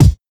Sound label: mid range kick drum single hit c sharp key